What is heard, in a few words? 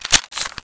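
Silencer Off, weapons M4A1; COD4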